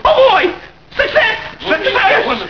success

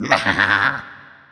mahaha

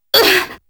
hit; 夏洛特聲音資料庫

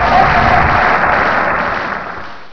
applause

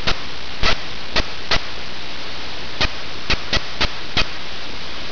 putt, turkey sounds

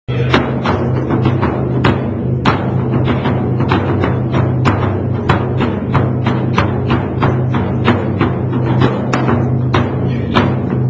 odaiko, taiko

